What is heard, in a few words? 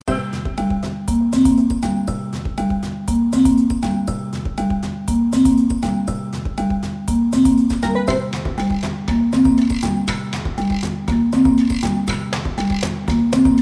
Fast Jungle Race Game Loop; Party Planet